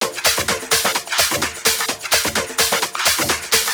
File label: House loops 128BPM; Vengeance Samples